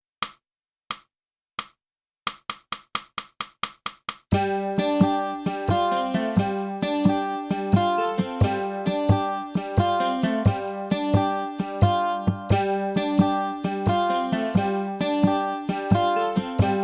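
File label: clarinet feeling the rhythm track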